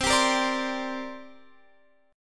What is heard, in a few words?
C 7th Suspended 2nd, Guitar, Open F; C7sus2 strummed